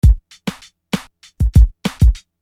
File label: Beat, Hip-Hop Street Beats